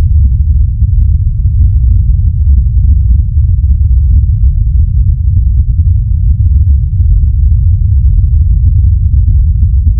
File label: pink noise 40 80